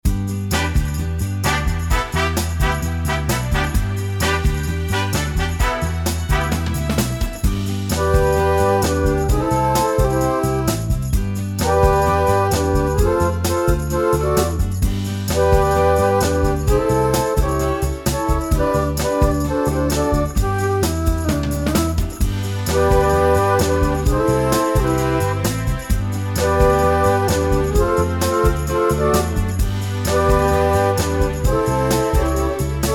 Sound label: FLUTE